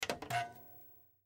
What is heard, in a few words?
Television On Button; Televisions; Electronics; Technology; Blastwave